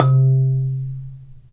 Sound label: Marimba; Roland LCDP11; Africa VOL-1; Af.Marimbas